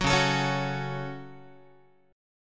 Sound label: Db Suspended 4th Sharp 5th Guitar 4A,4E,5A,5D,5G sharp,6C sharp; strummed; JGuitar